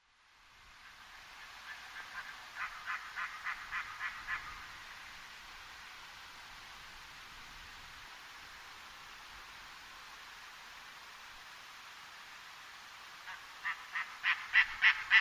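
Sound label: Steller's Sea Eagle, yakumo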